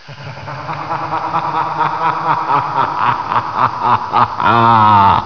detect

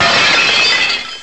glass; gearbox; debris

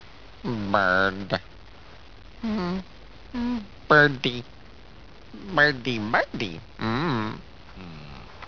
birdie

